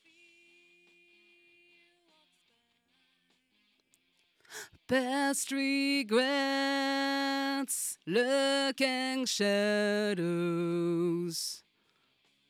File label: Vocal